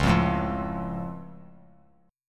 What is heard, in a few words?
Db Augmented, down; strummed; JGuitar